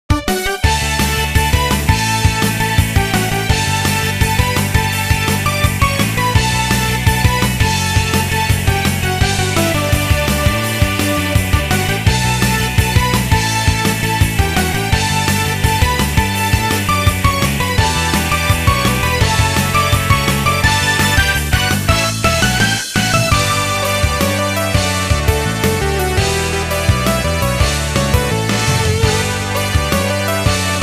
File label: Music Menu event